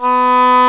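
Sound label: English horn; Dark Forces Demo; Dark Forces Instruments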